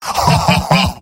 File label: m laugh happy; tf2; garrysmod; mvm